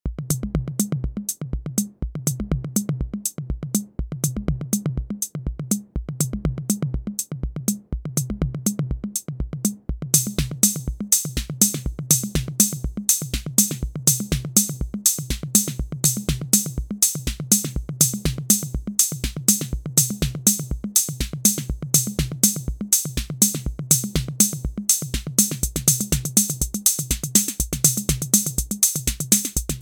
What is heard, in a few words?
TR-606